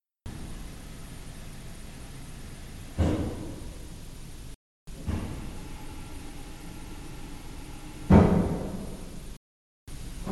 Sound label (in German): Pedal Posaune 16, orgel